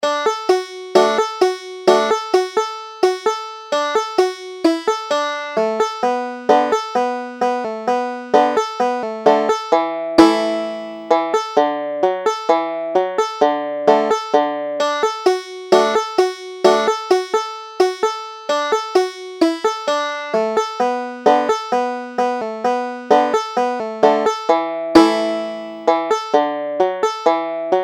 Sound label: winder slide, banjo